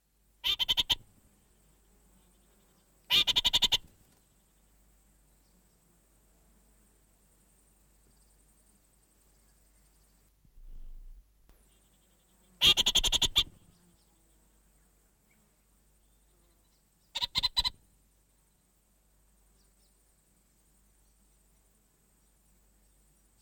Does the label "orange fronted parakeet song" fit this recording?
Yes